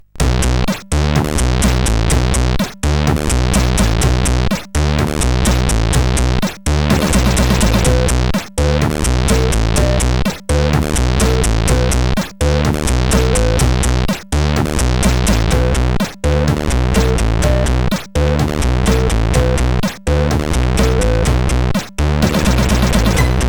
sid, CSG8580; Boing Baing

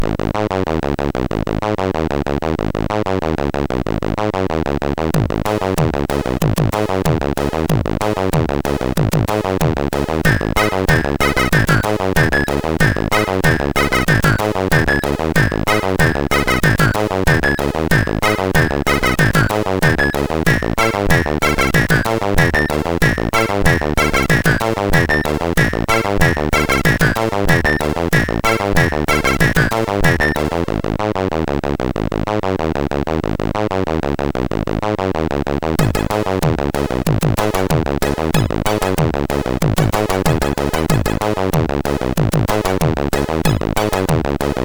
Pseudo Music, MOS6581R3

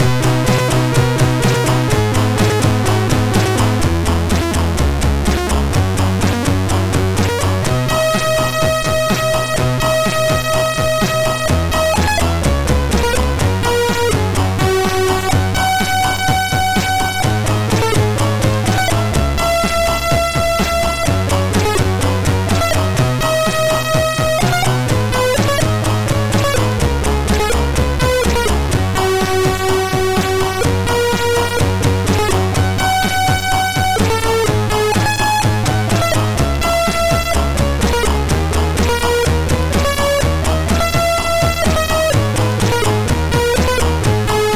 Air on G String, MOS6581R2; sid

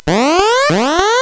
sid CSG8580R5; Jaws

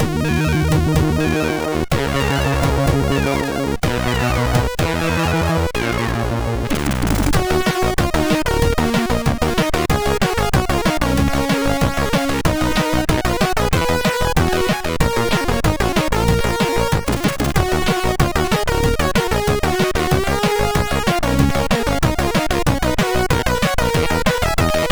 Groove, MOS6581R4; sid

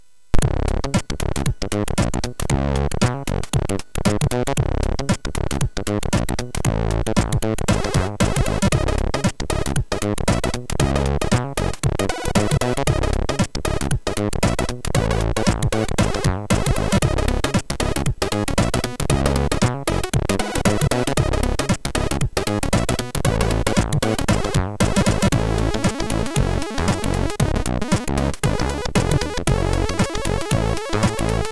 FUNKEY, MOS6581; MOS6581R3

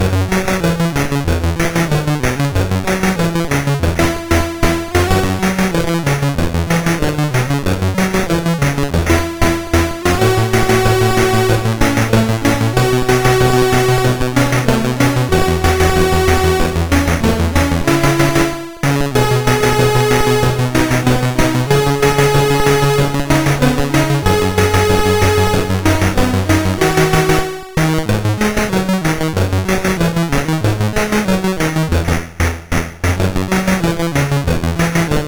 Synth Sample III, CSG8580R5

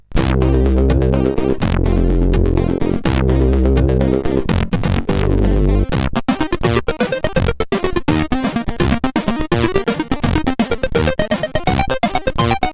Fastfunk, sid CSG8580R5

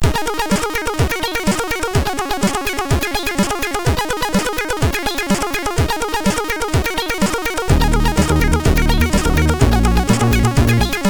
game over plus level, sid MOS6581R4

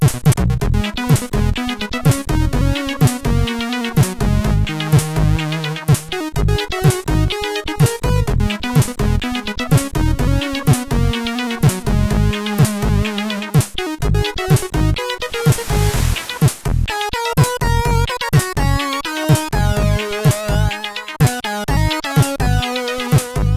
Test Tune sid CSG8580R5

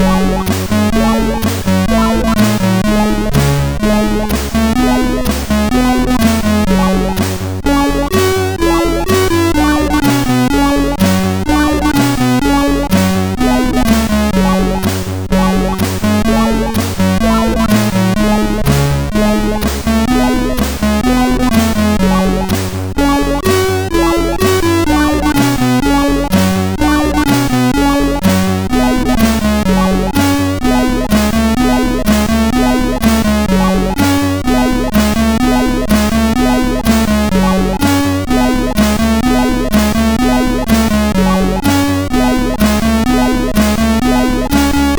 Russia-Mix, MOS6581R2; sid

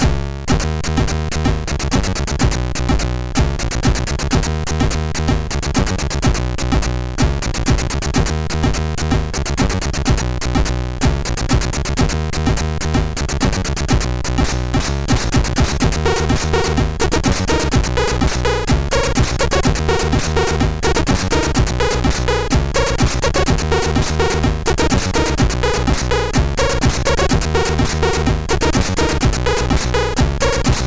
sid MOS6581R4; hvsc

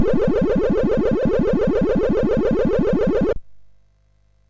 Final Synth Sample, MOS6581R3

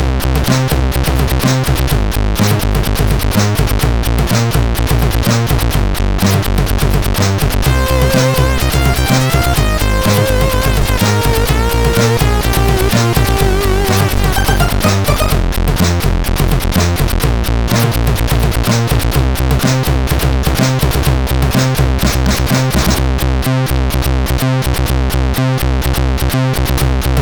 Demo Music, CSG8580R5; hvsc

